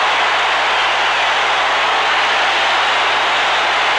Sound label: Baseball Crowd Cheer Loop; Crowds